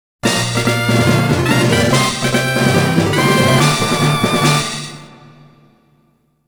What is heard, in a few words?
Fanfare - Badge Obtained